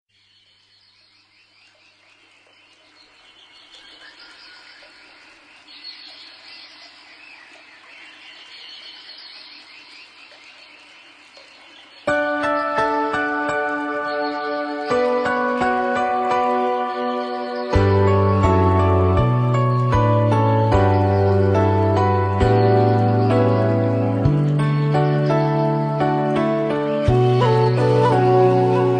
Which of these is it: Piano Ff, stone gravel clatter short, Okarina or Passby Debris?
Okarina